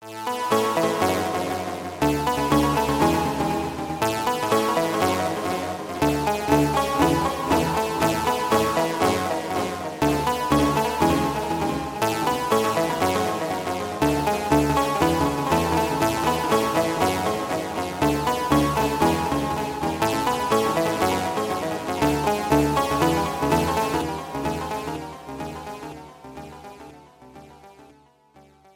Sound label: Chillstep House Electronic Ringtone